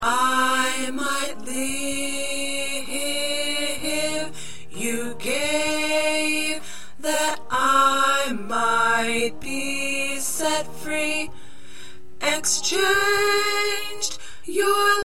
Alto

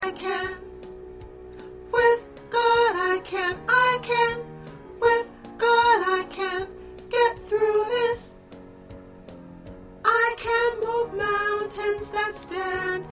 Alto, gospel songs; practice tracks

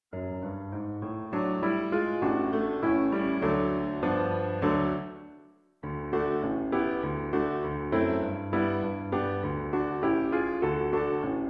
Piano

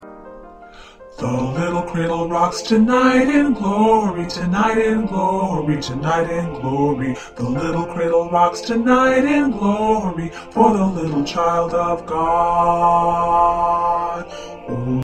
Bass

